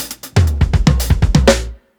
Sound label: 120 TOM FILL, DRUMS LOOPS; BASEMENT DRUMS VOL 2